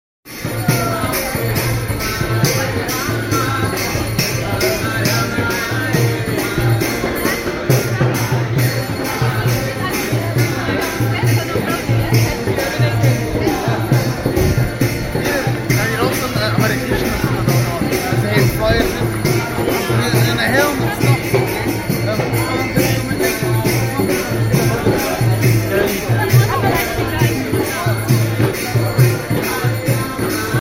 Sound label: Carnaby Street